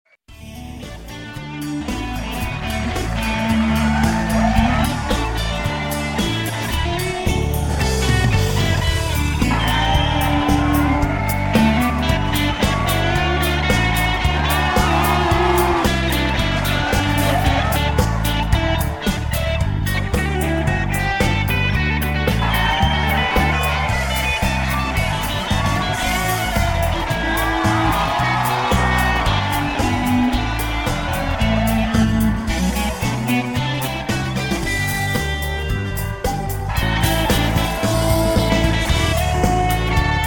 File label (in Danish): countryhus laud